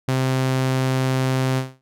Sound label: SAW; Alchemy